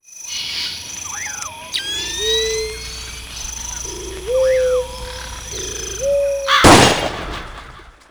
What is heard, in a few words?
bumper audio; Soldier Of Fortune II Double Helix; XBOX